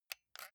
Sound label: console controller xbox rb press slow soft